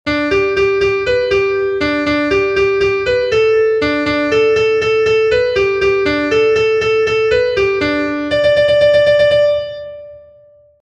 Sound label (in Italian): Galoppa cavallo, pianoforte